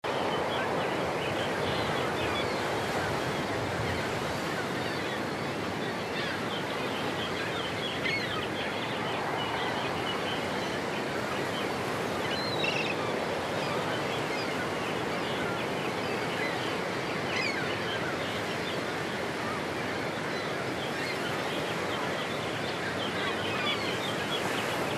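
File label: Ambience Ocean Harbor Birds Loop; the unfinished swan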